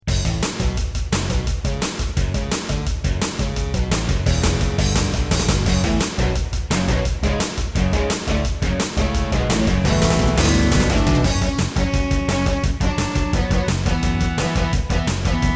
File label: Intro, Yamaha DB50XG GS MODE; Duke Nukem 3D